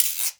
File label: EQ Perc, Percussion Mix